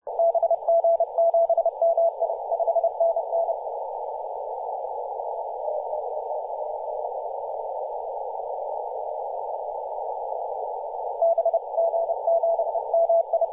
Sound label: 18 077mhz cw